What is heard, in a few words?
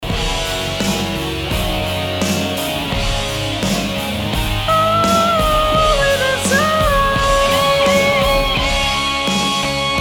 SOPRANO DIVISI, SHOW CHOIR; ADIOSES SHOW CHOIR; THE CASSETTE EXPERIENCE